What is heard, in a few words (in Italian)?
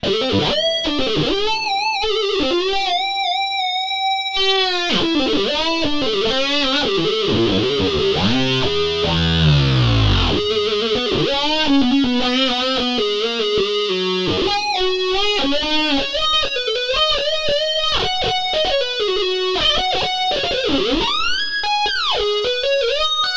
Lead preferito, Korg M 3